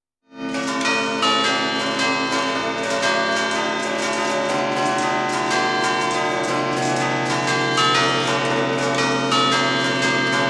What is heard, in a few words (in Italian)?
campane